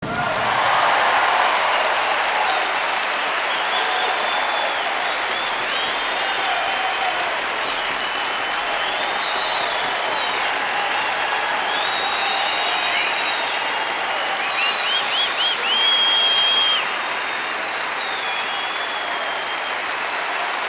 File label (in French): Applaudissements